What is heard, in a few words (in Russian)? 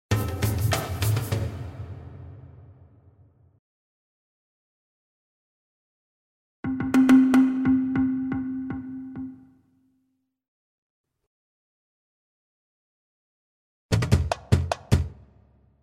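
средняя быстрая ритм-перкуссия заканчивающаяся коротким музыкальным логотипом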